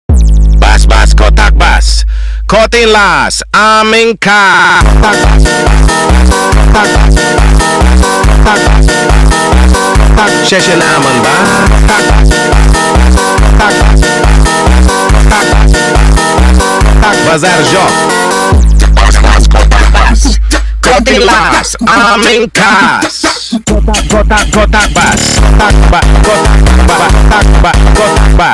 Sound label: funk